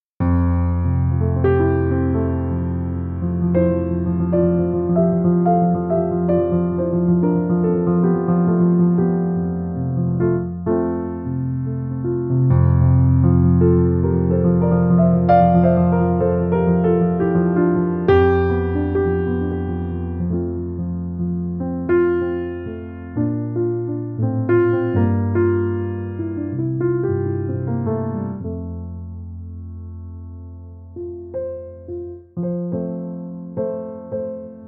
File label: Jazz For Sleeping Atmosphere Jazz Piano